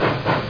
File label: chug; MPG-Net (USA); empire